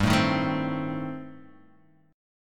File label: A Diminished, guitar; Adim/G strummed; JGuitar